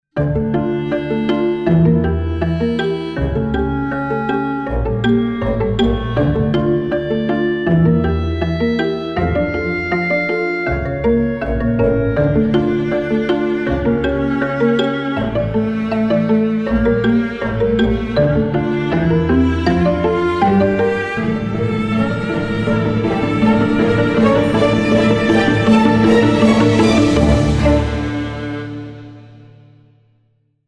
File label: Beautiful Nature Fighting Bugs